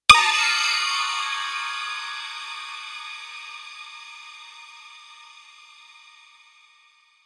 Warning; soma gamerip 2015

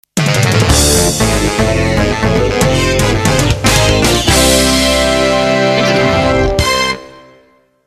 Music Victory; Pizza Tower early build test ost

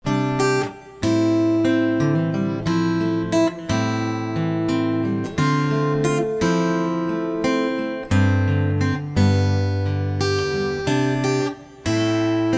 Solo Arranged; Firefly

